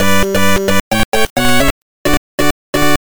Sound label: Unused Jingle; sutte hakkun; gb